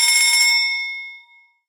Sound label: gambling win; Burning Reels; wazdan; ultrafast